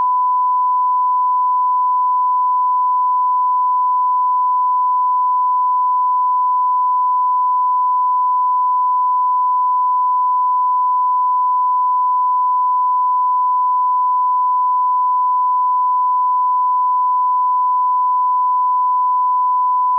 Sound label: Tone 1kHz stereo